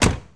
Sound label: 68 Road Runner Door Close; Automobiles